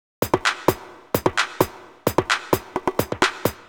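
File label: Percussion, 130bpm, Nu Dance Electro; Zero-G; Soundclash; Combi Loops; The Oldskool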